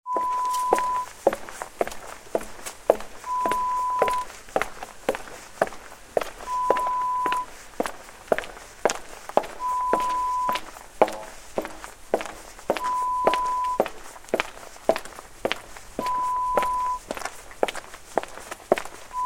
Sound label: Lonely Footsteps; Horror Sound Effect; Lo-Fi